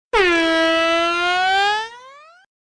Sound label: poor air horn